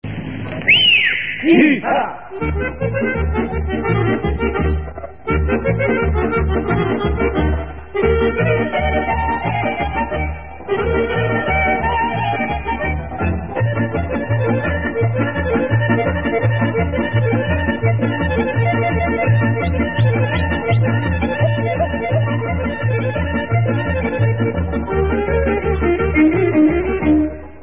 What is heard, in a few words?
Kolumbarka Serbian